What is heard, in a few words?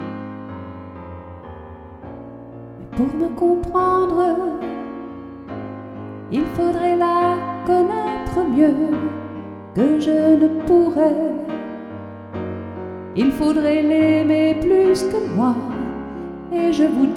piano